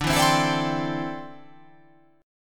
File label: D 7th Suspended 2nd Suspended 4th D